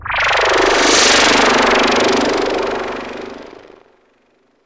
Sweeps Medium

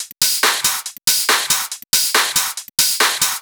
Loop 140 BPM; vengeance essential club sounds-3

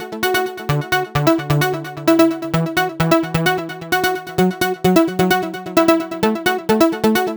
Special Sounds Root F# 130BPM; Vengeance Samples; VEH3 Special Sounds

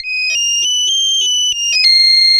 Arp C Line, ARP Odyssey; musicradar-synth-samples

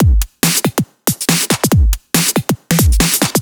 Breakbeat 140 BPM; Loops; Vengeance Samples

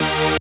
Synth Cuts; Vengeance Samples; VES1 Instruments

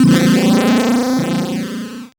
Arcade Riffs

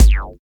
Bassdrum; Vengeance Samples; VEE Bassdrums

